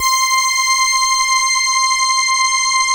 ELKA, String Machines; ELKA RHAP

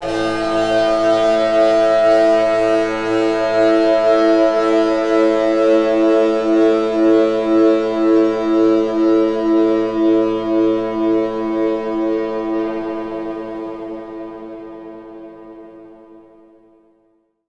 FX DIST; FX DISTO

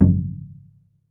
TOLOWA, American Natives, Earth Tones; E-MU Formula 4000 Series Vol. 4

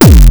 Roland Sh-101

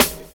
VINYL SD, SNAR; USB Soundscan vol.11; AKAI

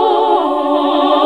SING, CLASSIC VOX1; Best Service; Hallelujah

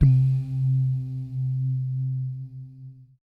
BS DUMM, Vocal Planet Jazz & FX; ILIO; BASS DUMMS

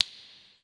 snap wet; InVision Interactive; Percussion Slam

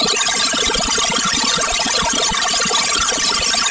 FM FX, synth; AKAI S-Series CD-ROM Sound Library VOL-6

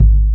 SURDO KICK, Ensoniq ZR-76; 300 Drum Machines

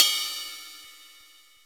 rivet ride bell; SN-R8-05 Jazz

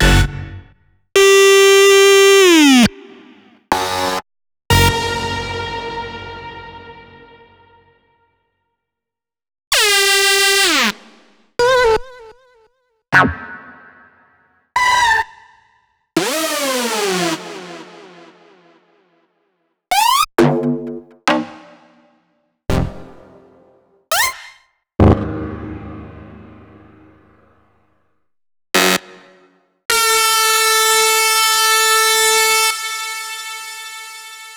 HITS, PLUCKS & STABS

